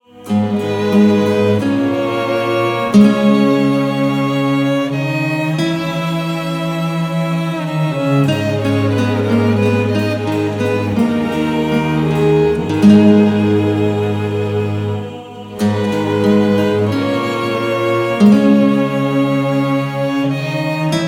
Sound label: (Violin & Guitar Cover)